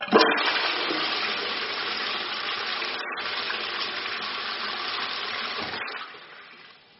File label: Flush